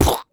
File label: Human Beatbox, Beatbox Hits; Vocal Hits; BD; Loopmasters